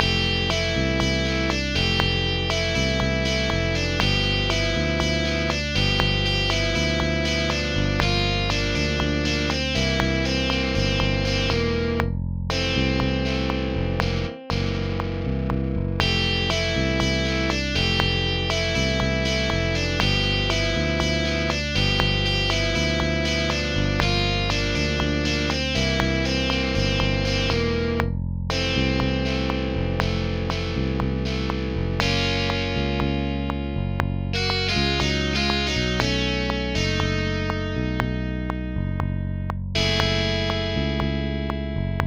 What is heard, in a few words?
no percussion